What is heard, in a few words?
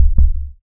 heartbeat; Cruelty Squad